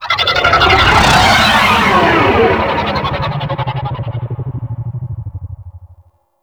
Liset Fly By Quick; Warframe; WM Liset

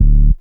Hip Hop Pack

